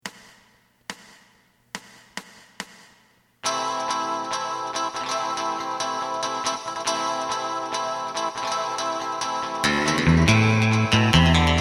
drum

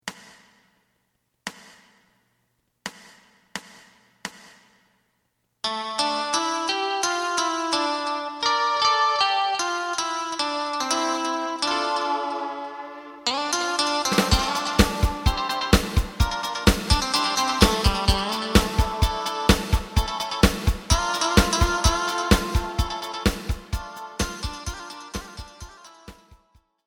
bass

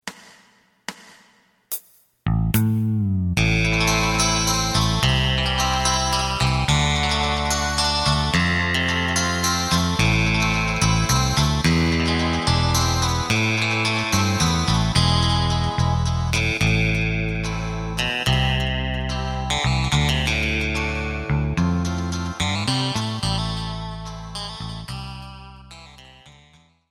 drum; エレキバンド用カラオケCD

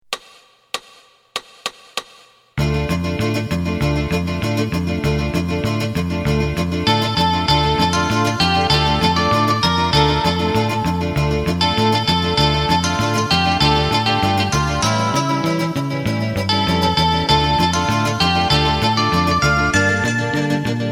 drum

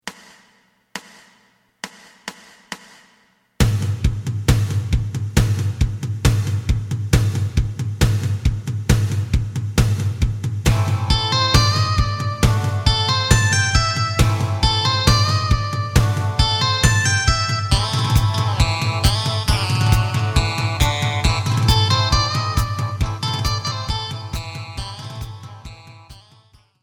bass

